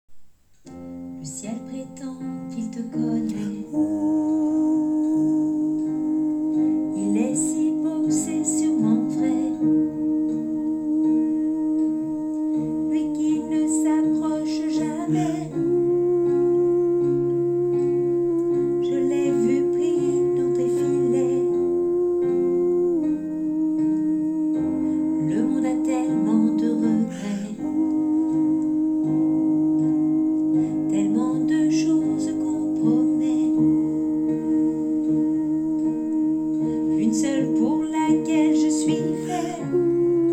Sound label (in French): je t'aimais soprano